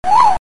suoneria botton; beep

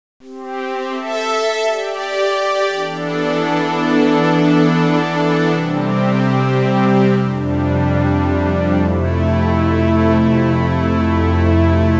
audio suonerie musica mistica

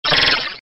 suoneria botton; beep

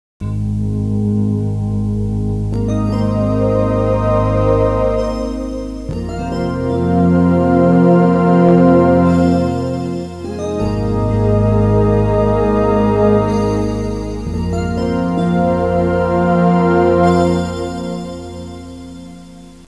audio suonerie musica mistica

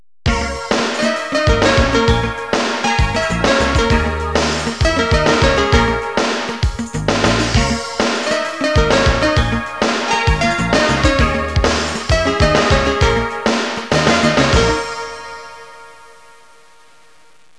suonerie businessy

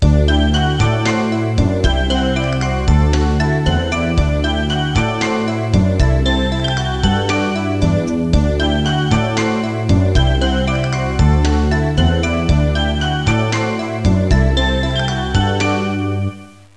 suonerie contemporanea; musica contemporary

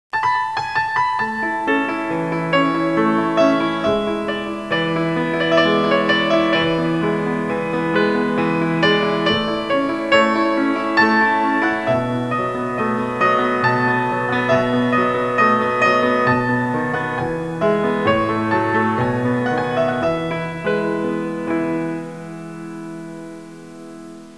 suonerie classica; musica classical

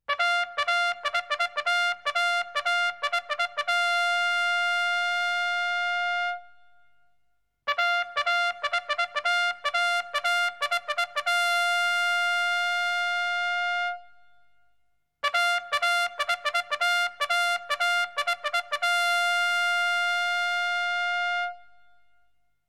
suonerie tromba militare

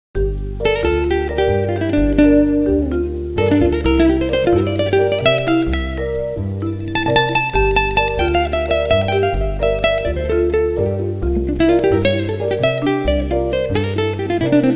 suonerie musica jazz

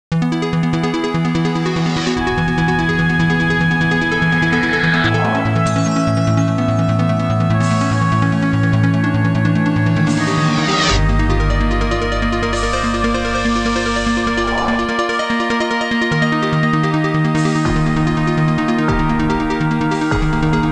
musica elettronica; Suoneria

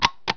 click on, orologi

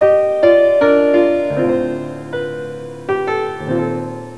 suonerie classica; musica classical

